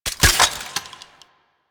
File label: Four Barrel Rifle Reload